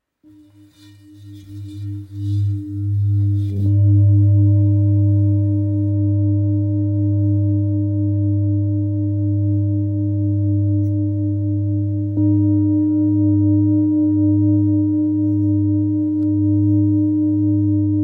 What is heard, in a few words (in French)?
Bol grave sol bouddha